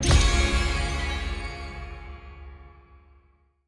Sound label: small Win; codex of fortune